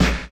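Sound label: snare drum bass drum, Dance Mega Drums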